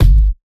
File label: subby kick sample g key